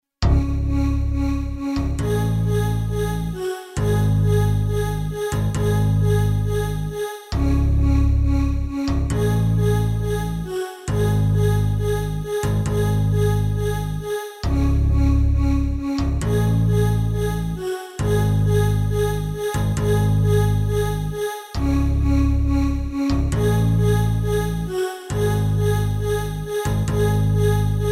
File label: xp multitimbric; Roland JV880